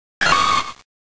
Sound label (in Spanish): Grito de Shroomish